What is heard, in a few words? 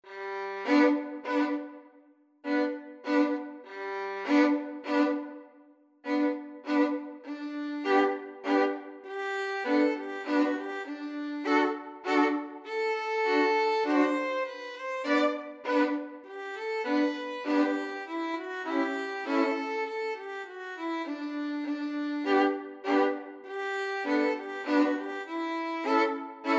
saint saens elephant, violin duet